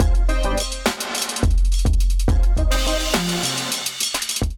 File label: Kit groove 105 bpm, Drum; MIDI Clips; Crackin; Ableton; Drum Essentials